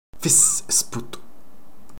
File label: Fizz Sput